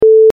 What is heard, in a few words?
beep